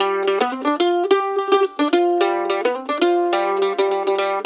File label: LICK, Blues Guitar